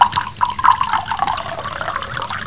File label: water